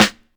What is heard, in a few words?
sharp snare drum sample g sharp